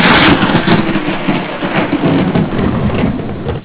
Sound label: storm, Yet another thunderstorm!